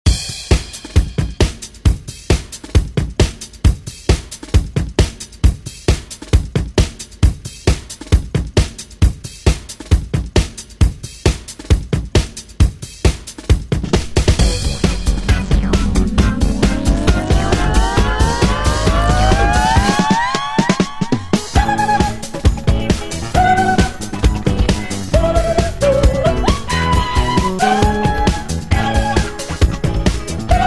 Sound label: 70s Re Drum; DJ Mhark